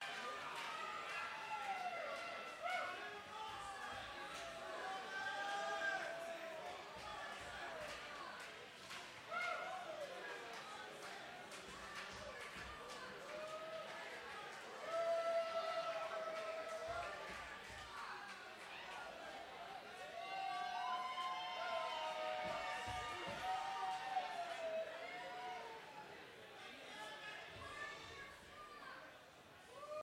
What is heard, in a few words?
Encore Crowd